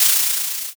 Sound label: curtain